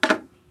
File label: Wood Plastic Hit Impact